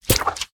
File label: Queen Ranged Attack